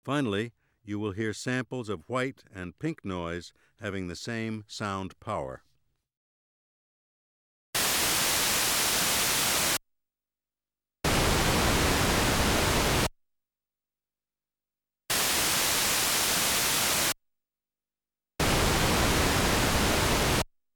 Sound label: Filtered noise